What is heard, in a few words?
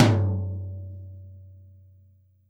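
JAZZ KIT TOM BASS, Drums Acoustic; AKAI; 90s sample CD; USB Soundscan vol.10; JAZZKIT 3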